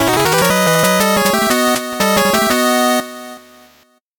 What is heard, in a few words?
8bit jingle normal